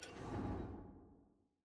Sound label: ui hover; vi